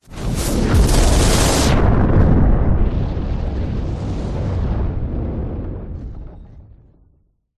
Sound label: explosion fire burst backdraft; zapsplat